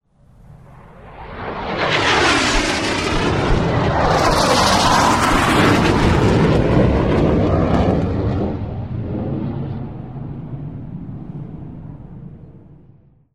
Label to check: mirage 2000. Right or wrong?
Right